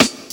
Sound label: Snares